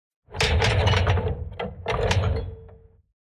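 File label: Door open close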